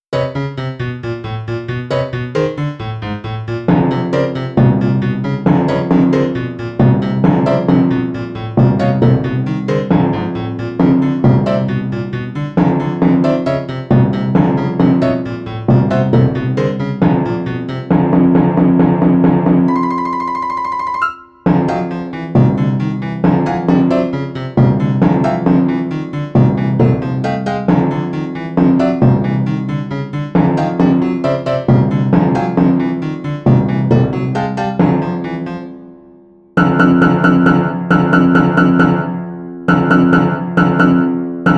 piano timpani

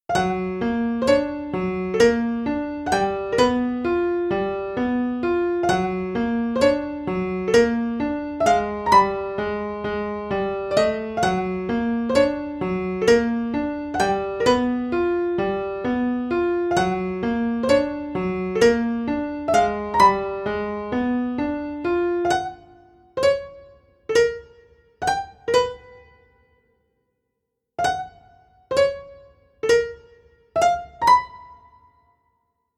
piano; kobylka grasshopper

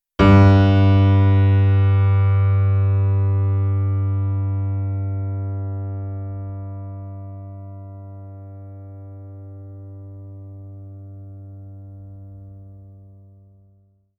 tuning g

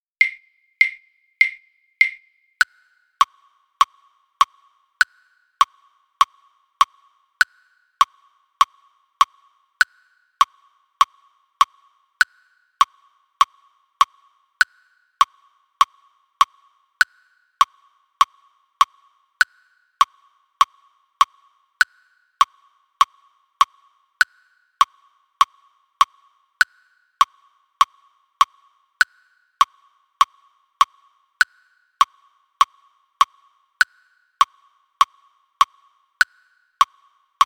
click

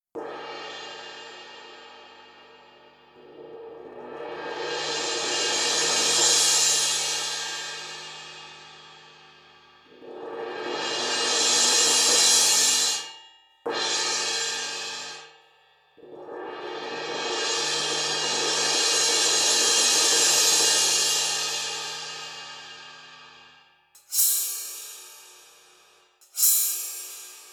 cymbal; snake

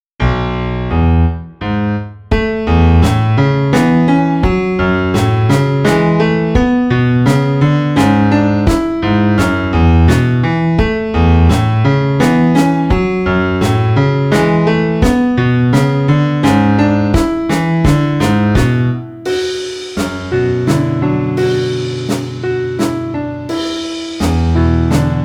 waltz piano drum kit

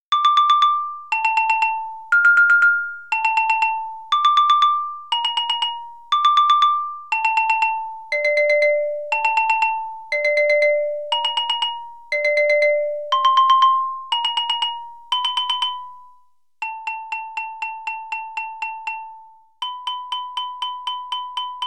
xylophone; train

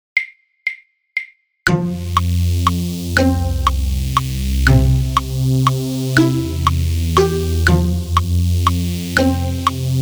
synth click 120 bpm; zetude no 2

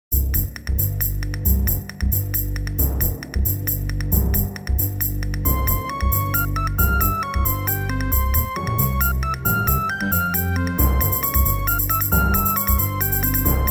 synth 90 bpm; etude no 11

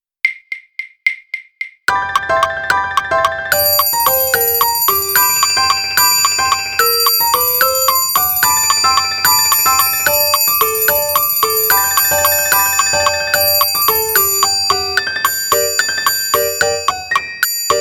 piano triangle click; kolibrik hummingbird

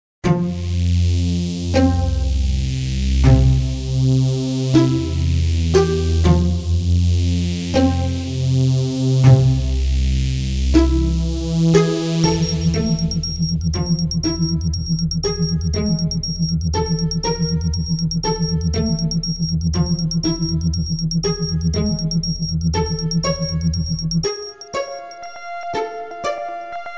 synth 120 bpm; etude no 2

